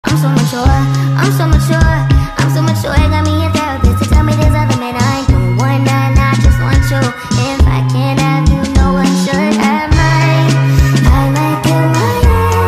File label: tiktok speed up